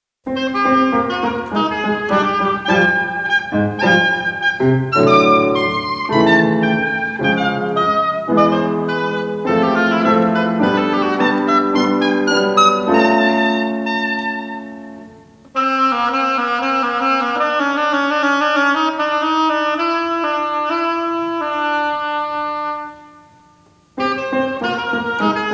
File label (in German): für Oboe und Klavier